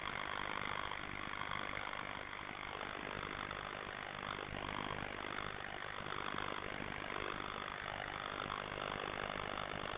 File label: surface noise